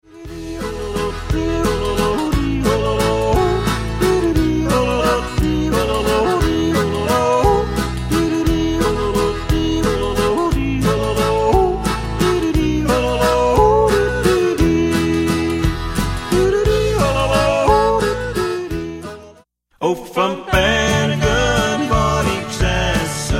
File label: yodeling